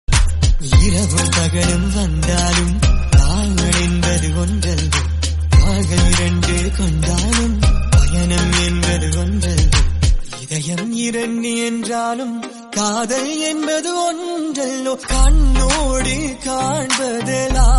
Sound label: Tamil trending